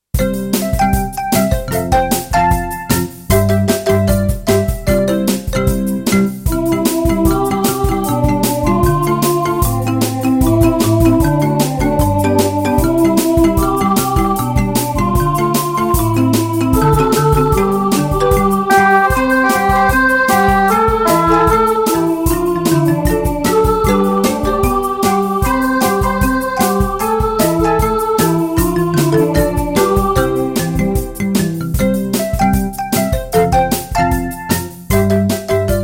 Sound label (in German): Polizeisong mit Melodie ohne Gesang